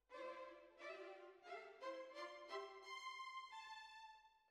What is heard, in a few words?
Flute